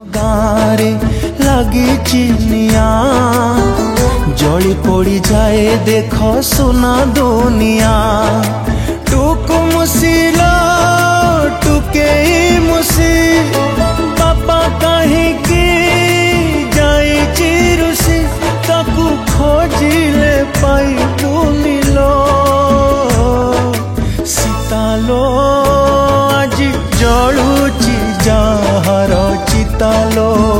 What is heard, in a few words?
Sad Song, Odia; Odia Movie Udandi Sita